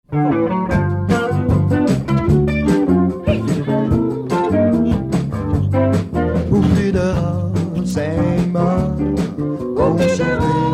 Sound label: jingle rock